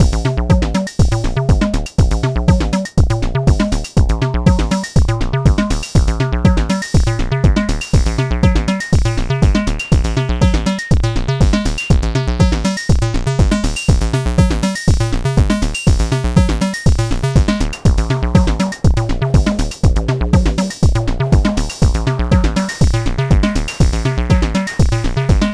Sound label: completely dry mix